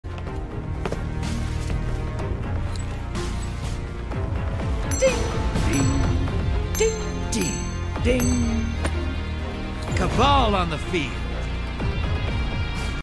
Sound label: Ding